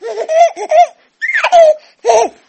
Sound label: Nursery Baby Laughing